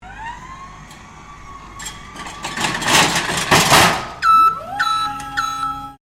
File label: FORKLIFT HAND TROLLEY COLLECTING PALLET LIFT STEREO, Hand operated fork lift trolley; Construction Vehicle